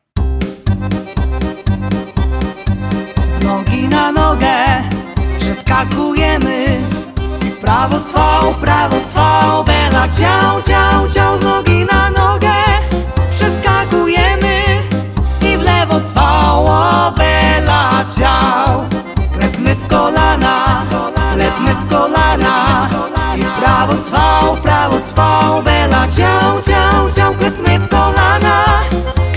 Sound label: BELLA CIAO VOC